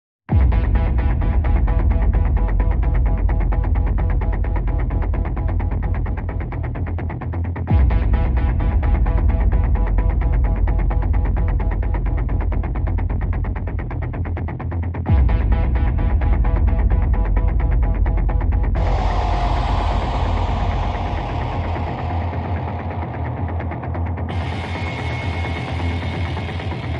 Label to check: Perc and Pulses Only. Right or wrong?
Right